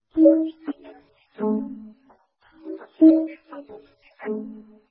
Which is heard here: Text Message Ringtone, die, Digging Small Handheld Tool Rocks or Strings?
Text Message Ringtone